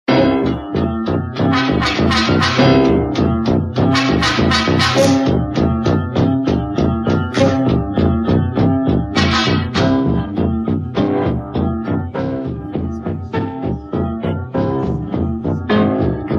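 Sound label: backing track; sl k chukovskogo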